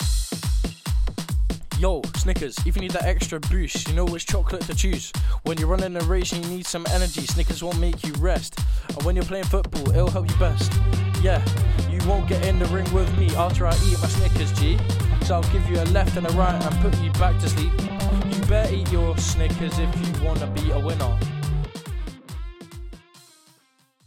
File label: Radio Ad Rap only; Snickers